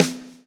lash, backbeat

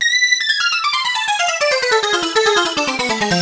Modal Riff, Keyboards

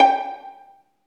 STR Violin, Solo Violin FX; Roland L-CD702; Solo Vln FX

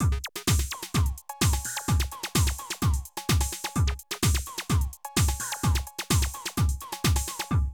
Drum loops, Euro techno; Zero-G Groove Construction (1993)

